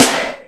snare a key, Royality free snare one shot tuned to the A note. Loudest frequency: 3093Hz; drum single hits one shots